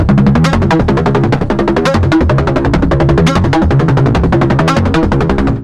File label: horde slayer, music; Left 4 Dead 2; Source Engine; zombat